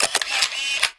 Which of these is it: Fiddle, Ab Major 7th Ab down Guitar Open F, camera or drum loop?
camera